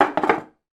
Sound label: household chair stool movement hard floor; sound effects one